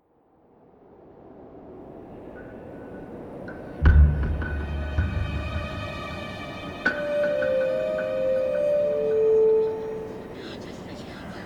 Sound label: Ambience